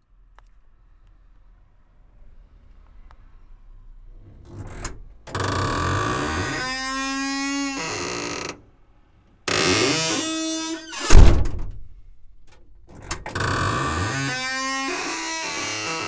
Creaking Door

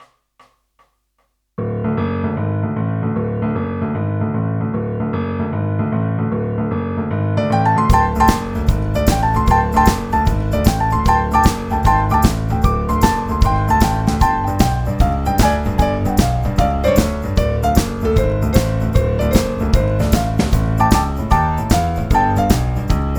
Boogie

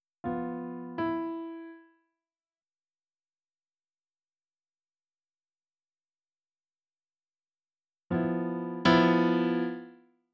welcome Mat Piano

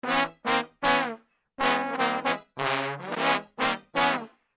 brass loop

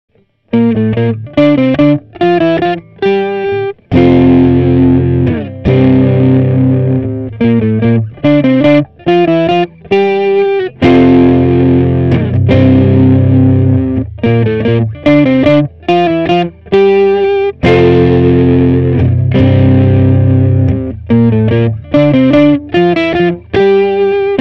Riff

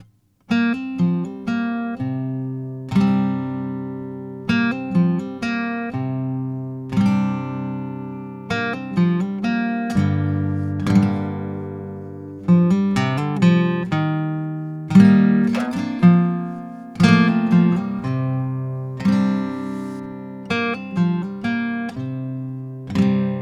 GTR

